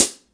HH, Drum Dry; East Collexion; Drum 1 Dry

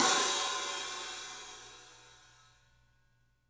Studio Hats, 3DPercussives

